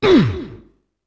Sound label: ATTACK; UFC Tapout; XBOX